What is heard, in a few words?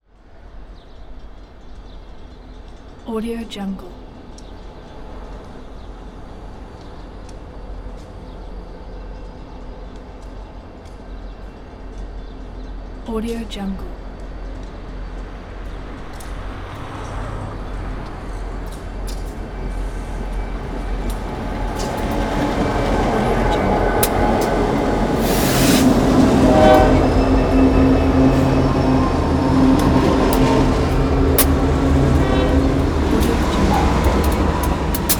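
freight train approach close up pass by; prosoundfx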